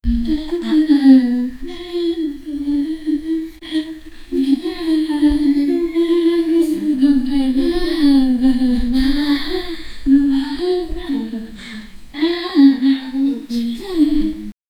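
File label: old ladies quietly giggle, laughter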